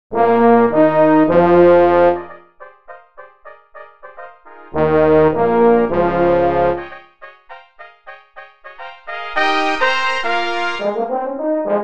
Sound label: dancing fanfare brass; A festive short fanfare for brass